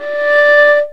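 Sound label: strings viola, chamber harm; Viola Solo; Vla Harmonx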